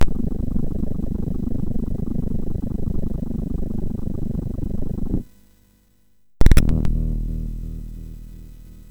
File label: Logo, soundtrack; Turrican 3; Commodore 64; Smash Designs